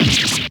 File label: stab